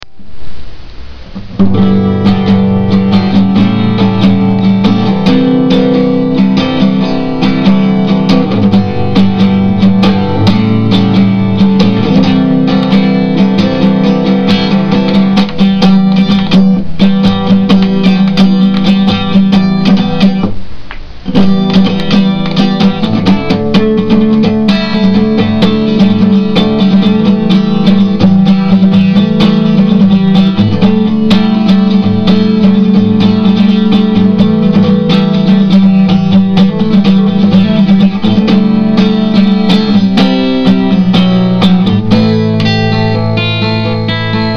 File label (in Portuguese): violão